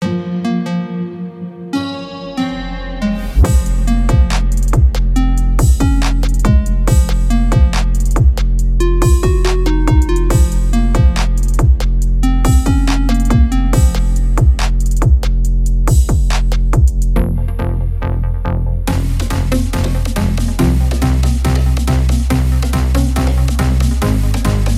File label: guitar dream